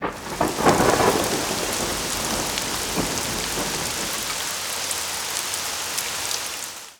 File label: Rain Moderate to Hvy with Thunder